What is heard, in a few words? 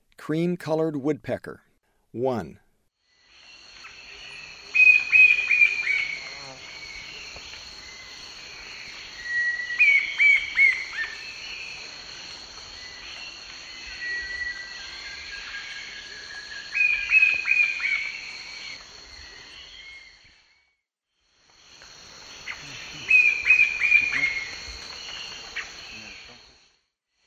Cream-colored Woodpecker; S. America; Eastern Lowlands